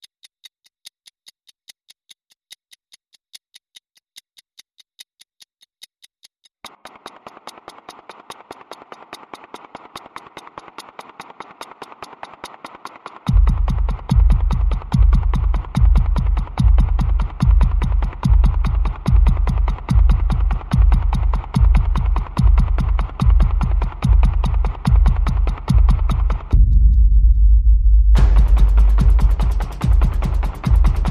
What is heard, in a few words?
Perc and FX Only